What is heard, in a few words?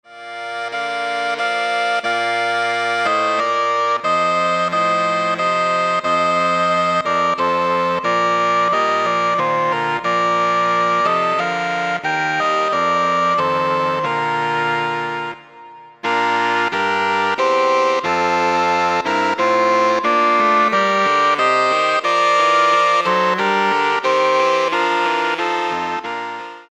Saxophone Ensemble